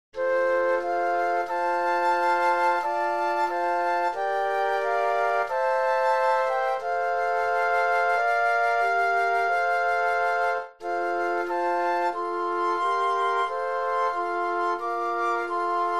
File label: Flute Quartet